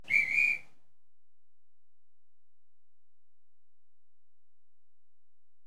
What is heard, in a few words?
Guard's Whistle; BBC Sound Effects Library; Age Of Steam